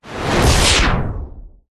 sound design hit whoosh lead into a crunch punch and weird tail; zapsplat